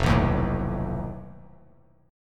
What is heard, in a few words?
F 7th Flat 5th F Bass Standard; F7b5 strummed; 1,2,1,2